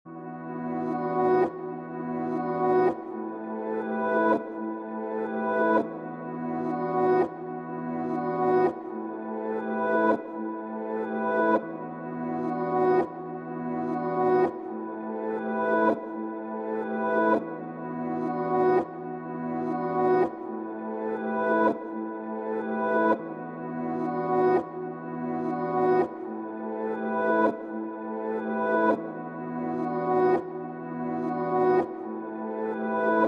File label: 166 BPM E Min Reverse Pad; Melody; Loop Stems; Cymatics; 2022 Melody Collection - Reverse; In The Clouds